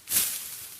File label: bad cast; actions; draugnorak